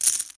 chips, casino; Bicycle Casino; XBOX

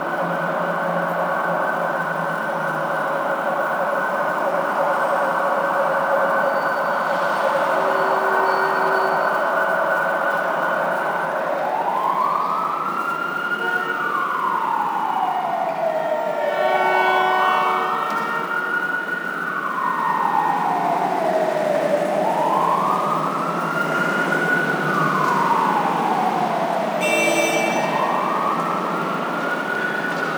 city medium police car; ambient; Project Gotham Racing

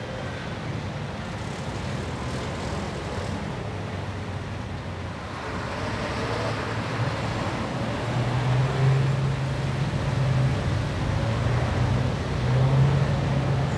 ambience; Street Hoops; XBOX

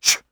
attack voice; UFC Tapout; XBOX

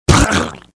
die, pain death, male; Soldier Of Fortune II Double Helix; XBOX

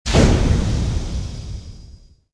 large platform start; movers platforms

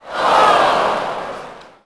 NEG Reaction,Crowd; Cars; XBOX

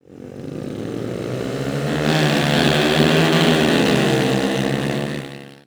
start

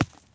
ball bounce sand; golf; ProStroke Golf World Tour 2007

